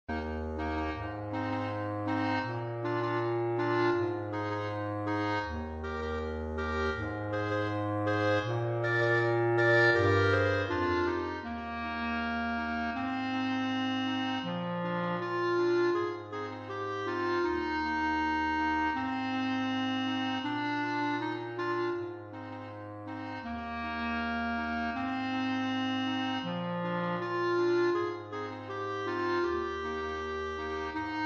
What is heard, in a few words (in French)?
Jamaica quatuor clarinettes